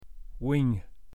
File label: Mouse